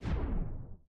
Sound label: shoot Horizon; Mindustry